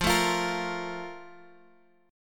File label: F Major Add 11th F; Fadd11 strummed; JGuitar